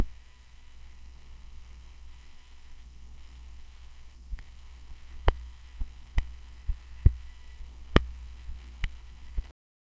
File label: static soft creaks like f, vinyl